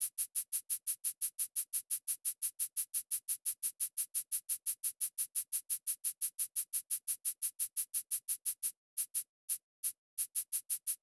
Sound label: Shaker, 087 Bmin, Drum Tracks; Hip Hop; big fish audio; Hip Hop Exotica; Apple Loops